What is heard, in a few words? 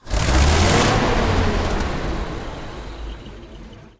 m1 engine load, tanks; War Thunder